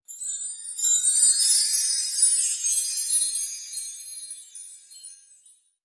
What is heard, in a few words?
bling